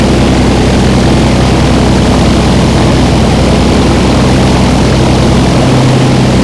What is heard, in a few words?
morava rear, engines; War Thunder